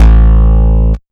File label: Bass Shot A